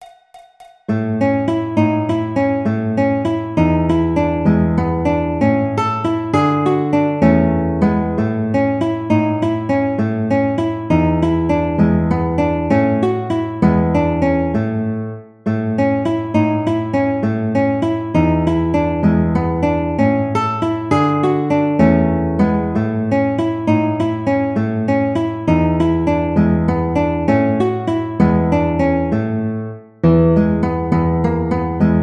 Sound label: Waltz Guitar; classical